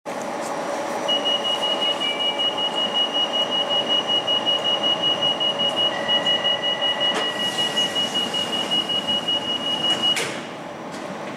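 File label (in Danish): Metro G1 dørlukning Tunnel; Emil Glentvor, Oktober 2022, Metro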